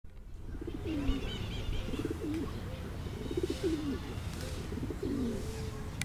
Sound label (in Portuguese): Pomba-doméstica